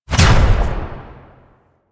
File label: Fire; Warframe; Tenno; Grimoire